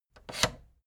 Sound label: fader plastic scrape single down halfway fast